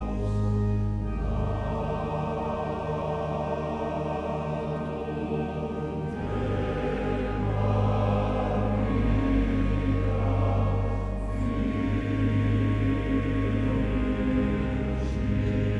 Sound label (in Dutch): Koor mannen Ave Verum Corpus; Mastreechter Staar